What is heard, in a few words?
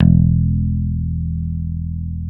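guitar, Dan Electro, 6 string; Roland LCDP02 Guitar and Bass; Dan-O 6 Str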